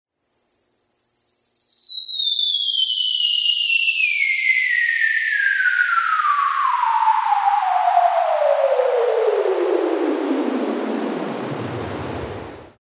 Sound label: noise